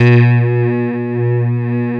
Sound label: ESQ 1 DIGI, Synthesizers